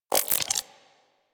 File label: Shotgun Mech; Weapons; Revenant; Warframe; Tenno